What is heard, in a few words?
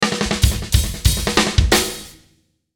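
Musical Element, Drum Snare March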